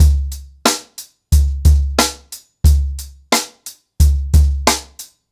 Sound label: Ripple Effect 90BPM, acoustic drums; The Roots Drumloops